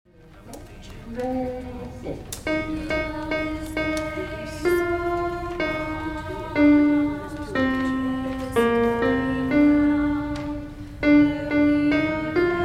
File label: alto